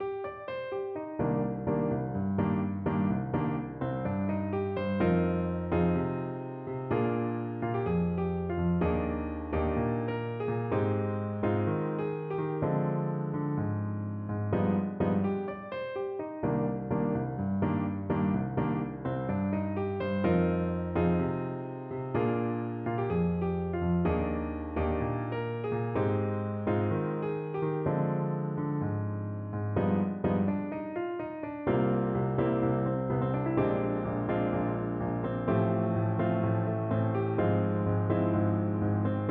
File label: Bossa